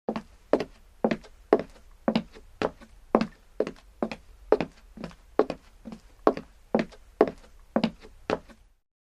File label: Footsteps Female